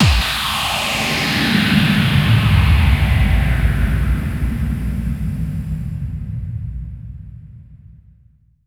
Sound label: Bassdrums FX, FX Kicks; Vengeance Samples